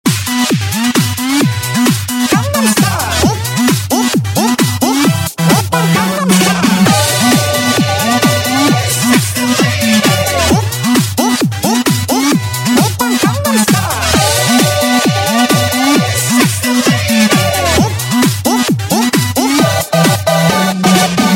Root format mover, special effect

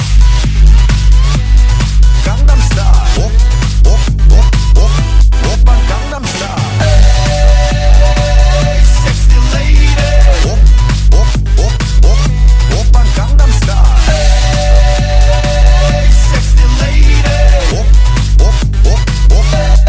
Resonance, special effect

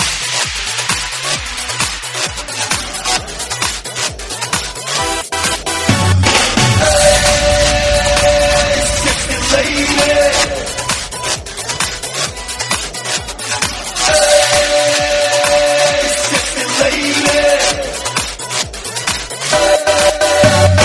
karaoke

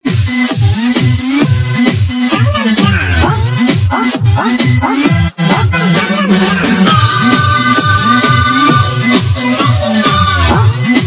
Frequency morpher, special effect

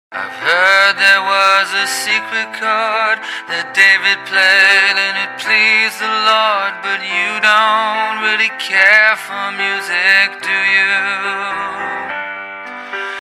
Old radio music, Sing

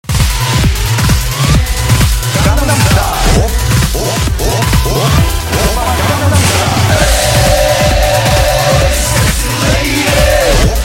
Chorus plus; special effect

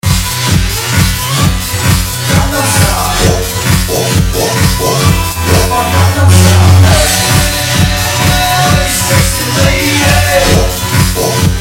Techno world

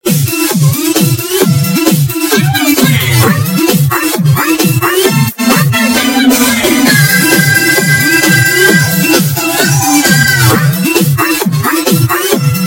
Samurai

